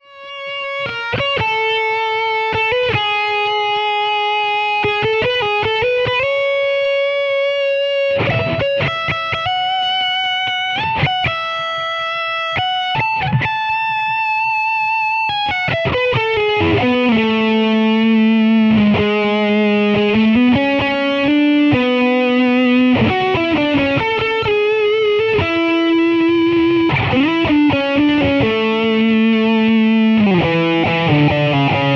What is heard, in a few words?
solo snippet, podxt